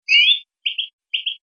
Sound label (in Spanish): jilguerada; verderon